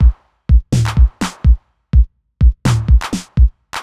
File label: drum loop scatter stripped 125, Classic Chicago House; Sample Magic